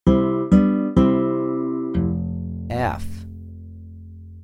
F0 Acc Bass Major; ear training instrumental color

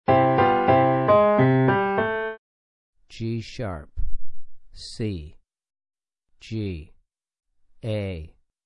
Piano Maj g#2 c2 g2 a2; ear training four note melodic

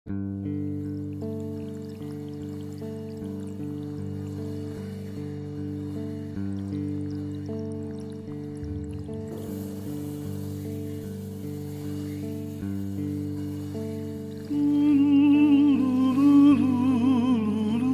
Zuni Lullaby; songs of the nations volume one

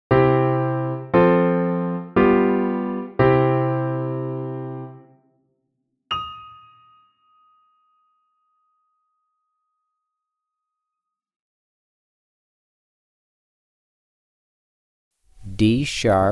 D#5 F6 Grandeur Piano 2 Note Stereo; Level One “Stereo” Example; ear training two note

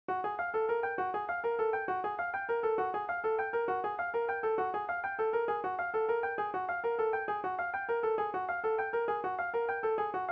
Key F Fast; harmonic and melodic equivalence v1

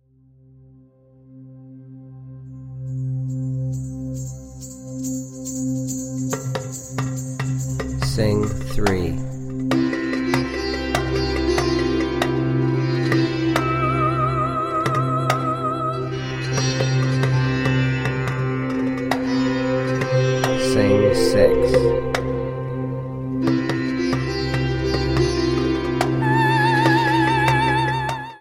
Soprano Key of C; real world music ear training

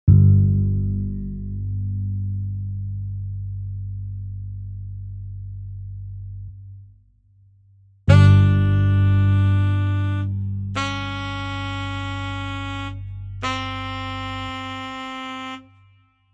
D Db C G Drone; trichord ear training; 3 note combination singing exercises

